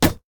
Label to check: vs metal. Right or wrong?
Right